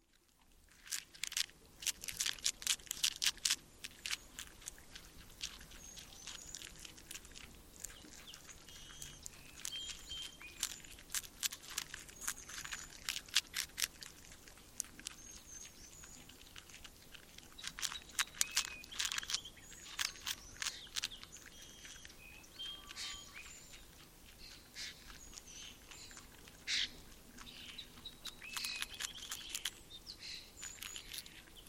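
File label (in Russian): звук когда бобр ест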